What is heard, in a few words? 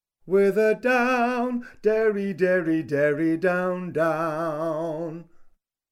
Ravens Vocables Treble; three ravens and twa corbies